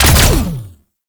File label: Sentient Conduit 3D Fire, Weapons; Warframe